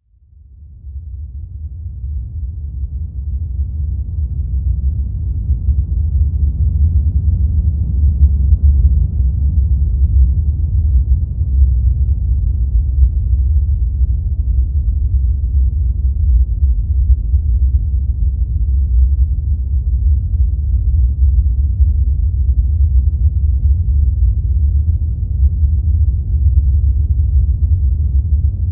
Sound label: rumble